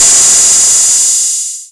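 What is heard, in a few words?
TR606 Hat Open overdrive, Roland TR-606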